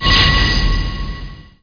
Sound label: Teleport